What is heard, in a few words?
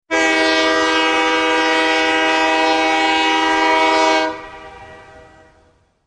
Serious Alarm